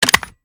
g36c bolt; daffas arsenal; tacz

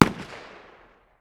ar 556 medium distance, 5.56x45mm; tacz-tweak-pack; taczmechanics

